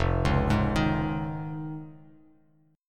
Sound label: F Minor 7th Sharp 5th, Bass Standard; Fm7#5 arpeggiated; 1,4,6,8; JGuitar